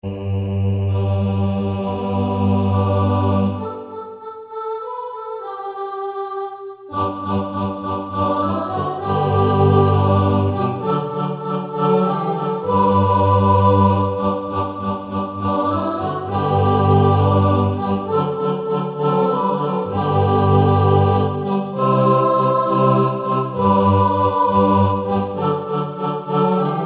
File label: SOPRANO